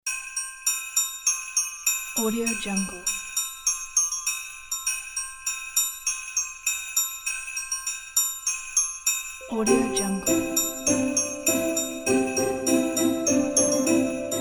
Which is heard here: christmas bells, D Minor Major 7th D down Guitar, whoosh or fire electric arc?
christmas bells